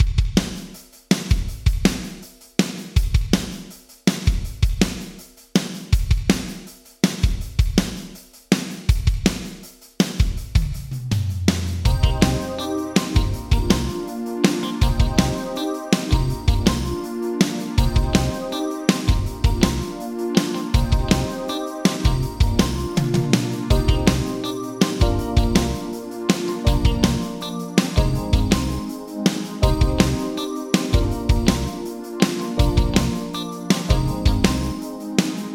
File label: Drums Yamaha ProR3 ER Gate Random; Gate 1; Vintage Digital